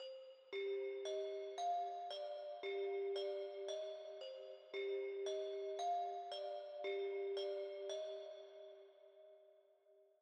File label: 114 BPM C Min Bell; Dark; Cymatics; Fracture